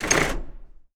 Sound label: Circle Heater Grating Scrape; Aberdeen Music Hall; Choir Stamp BD